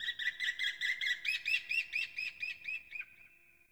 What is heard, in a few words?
Birds Low; Ambience Plains Landscape; Warframe; Eidolon Plains